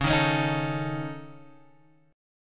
C sharp Suspended 2nd Sharp 5th C sharp Guitar Open G, chord; 11,8,11,8,10,x